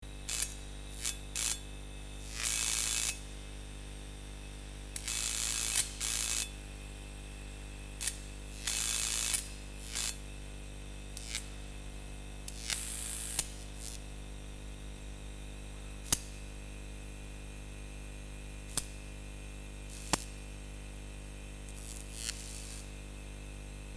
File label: Neon light buzzing and flickering